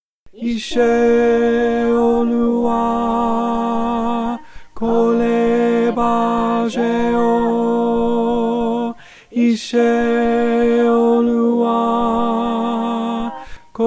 Ise Oluwa tenor

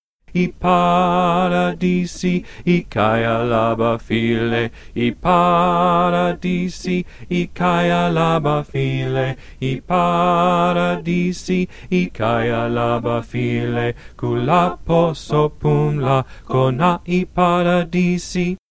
Ipharadisi bass

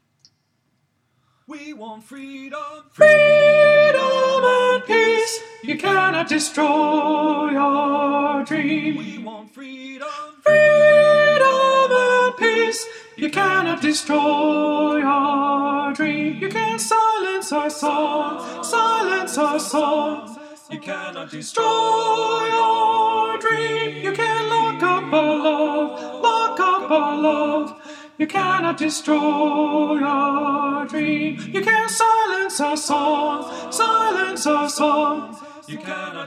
Freedom and Peace Soprano

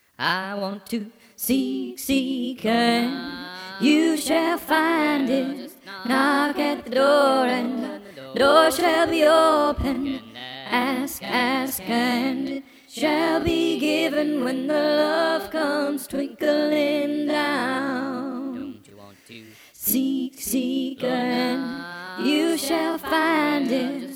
alto

